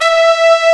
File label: trumpet; Inside Mac Games; X-Mas Lemmings Demo; Music